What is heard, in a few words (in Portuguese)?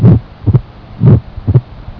estenose mitral sopro pré-sistólico